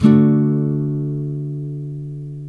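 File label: NYLON, D MAJOR DOWN, CHORDS; Spectrasonics; Hans Zimmer Guitars VOL-1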